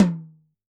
Tom, drum

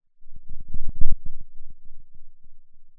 pop, Sound of a thunderstorm

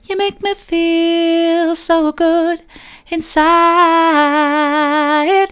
you make me feel so good inside soft, vocal

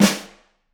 Flam, drum

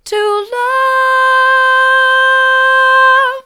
to love mid, vocal

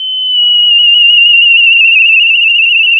pop, sound of a tea kettle whistling

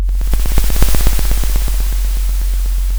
Sound of a thunderstorm

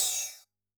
Op Hat, drum

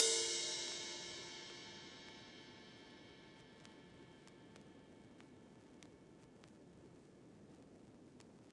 Vinyl Ride

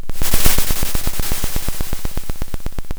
pop, Sound of a drum roll

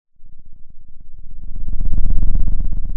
pop

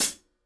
ClHat, drum

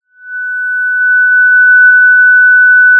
Sound of a police car siren

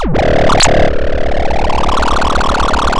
pop, chainsaw

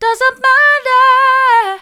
doesn't matter mid, vocal

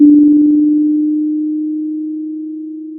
pop, Sound of a church bell

